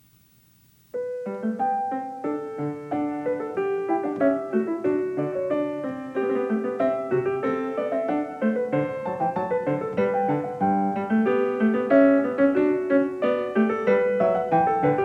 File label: Canone alla Sexta a 1 Clav.; Variation 18; Bach; The Goldberg Variations